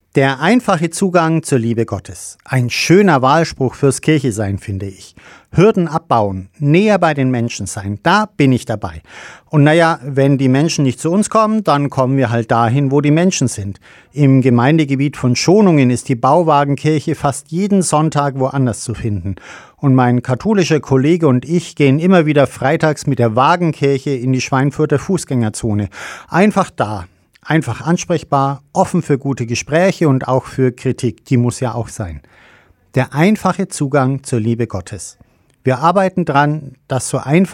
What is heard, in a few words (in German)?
gong